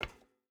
footstep hide metal walk; Rust

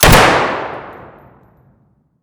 rsass fire indoor distant; Escape from Tarkov

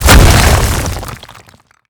Projectile Sounds; Red Orchestra 41-45

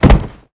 bodyfall wood

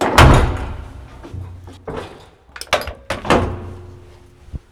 Vehicle reloads; Red Orchestra Ostfront 41-45

